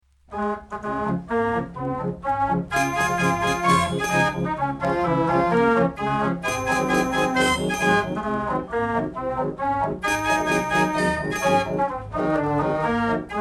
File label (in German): Karusselorgel